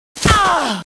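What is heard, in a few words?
Succubus Die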